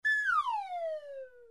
slide whistle down

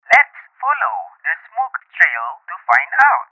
phone

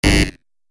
wrong electricity buzz

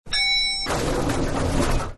lift Elevator Sound Effect and Ding; smartkindertech

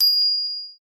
dingg

